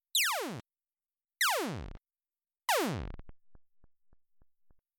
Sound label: beam